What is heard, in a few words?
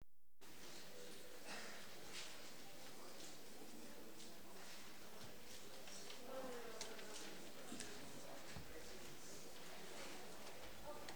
Audience Applause